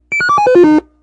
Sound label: 7 Tone Down; noisetoys; BEEP